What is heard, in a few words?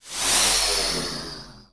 Fighter Run; Sid Meier's Civilization 4 Complete; Beyond the Sword; Final Frontier; Units